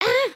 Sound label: need to pee again